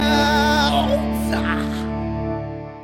rock message tone

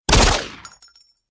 pistol

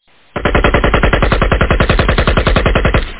ak47

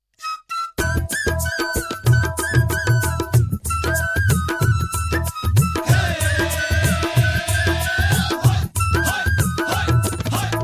Classic Bollywood

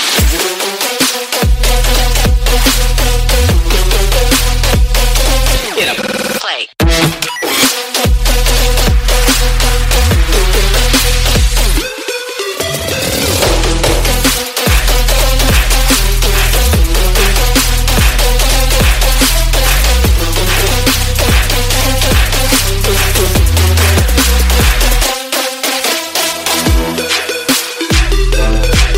Pyro Drop Ringtone

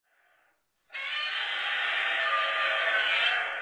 roar; godzilla

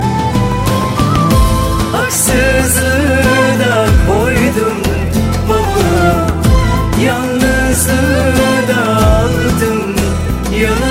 EEEEEEEEEE

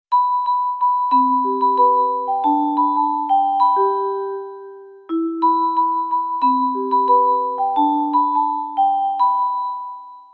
pretty orgel tune

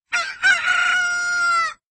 Rooster

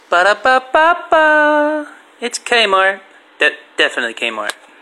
Jingle; Kmart

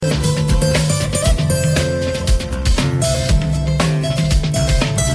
punjabi tone; hip hop

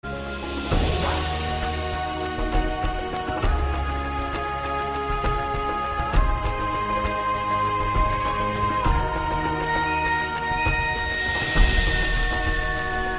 love, ringtones

